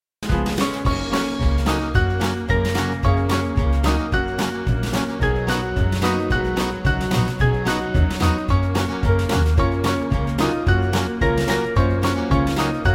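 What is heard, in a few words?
Band, Music Backing; William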